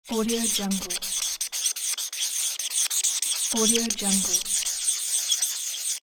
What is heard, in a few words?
marker pen